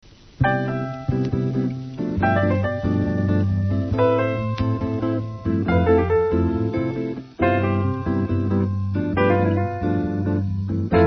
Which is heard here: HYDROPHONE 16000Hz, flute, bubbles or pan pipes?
flute